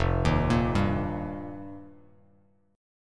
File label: F 9th Suspended 4th, Bass Standard; arpeggiated; JGuitar